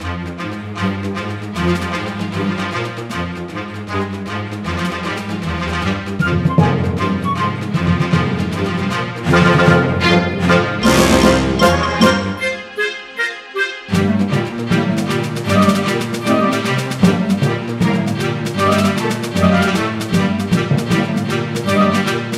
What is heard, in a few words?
Tune Loop; Fable Heroes